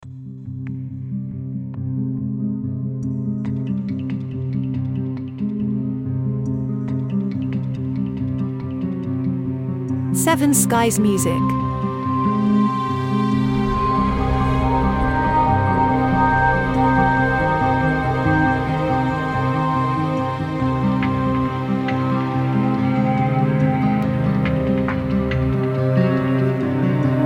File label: Ambient Suspense